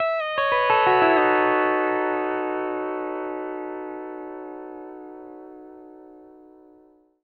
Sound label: PD LOOPS 120, Whole Lotta Country